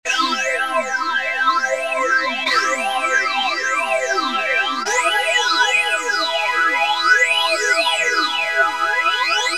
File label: jd990 brass mam phaze2